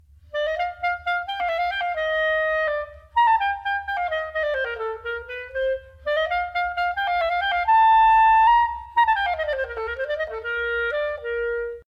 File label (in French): Clarinette extrait